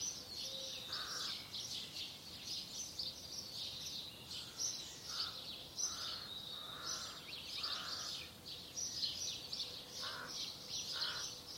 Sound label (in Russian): krasivoe bezzabotnoe rajskoe penie ptic na fone karkaniya voron